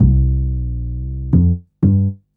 Upright Synth Bass, Drum & Bass; Fluid Dynamics

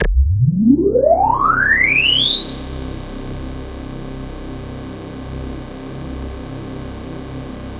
KORG DW8000, Vintage Keyboards; Big Fish Audio - First Call Vintage Keyboards

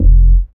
dance bass; Sound & Vision - Gigapack II CD 1

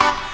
KEY, Synth FX Misc

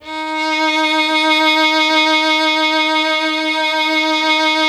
violin, Solo Instruments; Miroslav Vitous; Solo Instruments VOL-2; Solo lnst. II

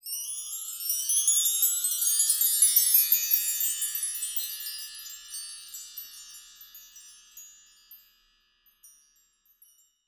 Chimes Rising, Wind Chimes; Sonic Foundry (Sony Creative Software); Joe Vitale Latin Percussion

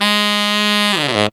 TENOR FX, Horns; Zero-G; Phantom Horns

